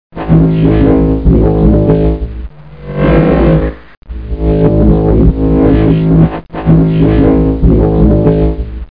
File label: hum; DR BRAIN; BUGS